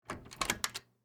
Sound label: Door Open Hotel, Foley, Door, Open, Hotel, Room; Miscellaneous Doors; BlastWave